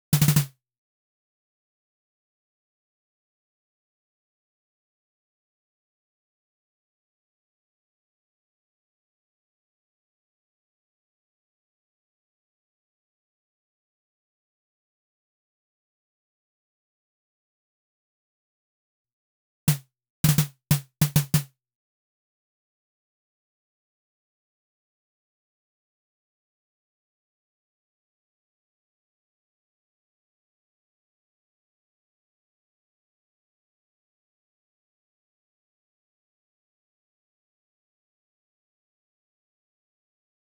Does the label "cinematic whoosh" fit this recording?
No